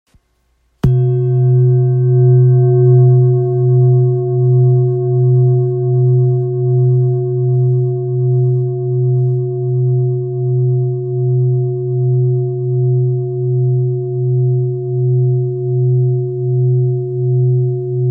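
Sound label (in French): Bol therapeute si, bol chantant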